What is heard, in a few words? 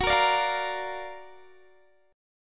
F sharp Augmented Major 7th, Guitar Half Step Down